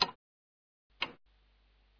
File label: clock, ambience